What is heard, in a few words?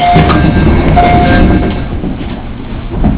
door, series 211